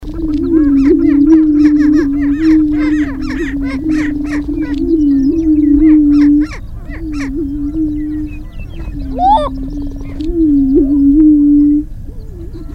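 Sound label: Flushed, Greater Prairie Chicken; Gallinaceous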